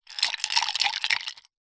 food drink glass soda ice shake short; zapsplat

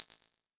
multimedia rollover; sound effects one

